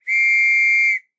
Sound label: trench whistle